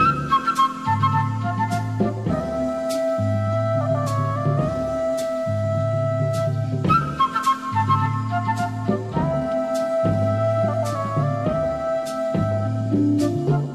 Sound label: flute thing, Loops, Jazzmatazz